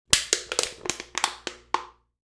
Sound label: can crush; drinks